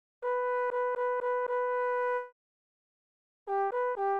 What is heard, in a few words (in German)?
Rhythmus Ubungen; Notenlesen lernen